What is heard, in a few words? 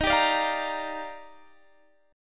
Eb Major 9th, Guitar, Open D